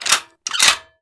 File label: hmg deploy; weapons